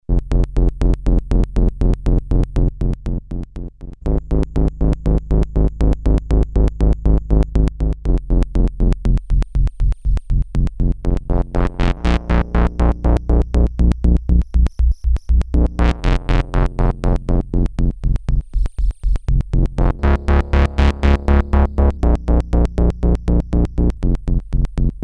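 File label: lfo amp, synth; Polivoks formanta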